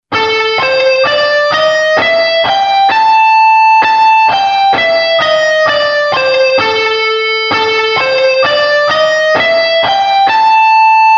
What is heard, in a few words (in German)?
A Blues Tonleiter